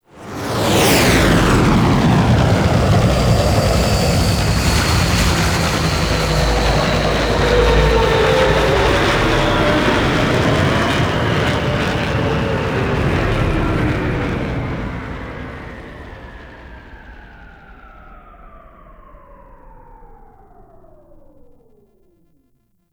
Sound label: Camp Spawn Dropship; Quests; The New War; Drifter